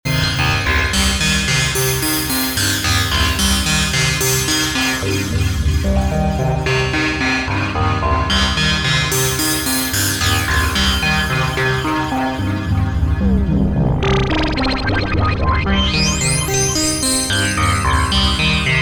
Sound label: Single Synth Loop, Techno; Model 15